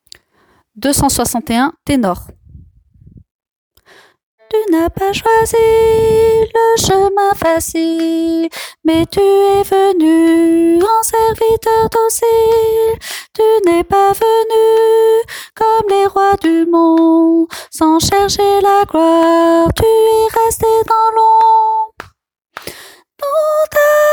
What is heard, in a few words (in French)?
ténor